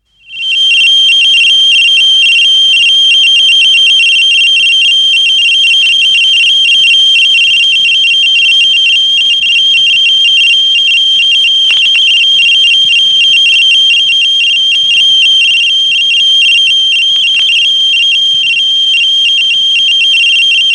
Telstar Sat, Telstar Satellite, telemetry effects from satellite; Miscellaneous Electronic; BBC